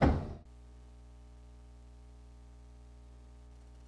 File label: Motor 2, train; nankai6000; OpenBVE